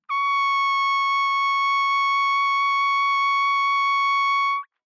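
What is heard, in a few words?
Trumpet vibrato ff Db6 stereo, Bb Trumpet; MIS Pitches - 2014; Brass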